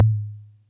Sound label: Music Percussion Computer Tom, Drum Machines